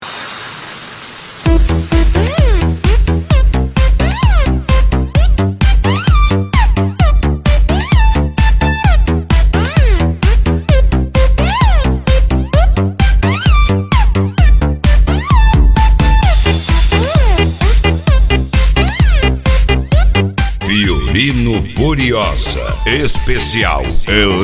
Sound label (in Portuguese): eletro funk